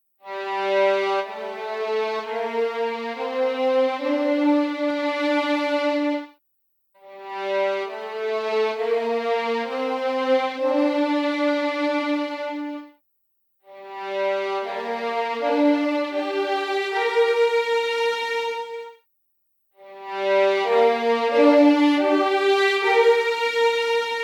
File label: First Violins Test Legato Main; LSS